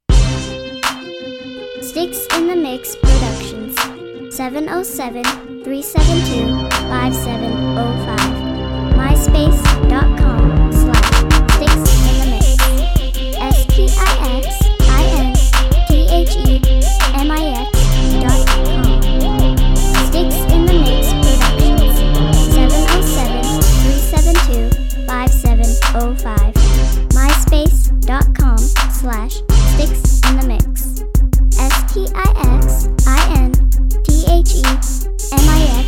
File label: medal crunk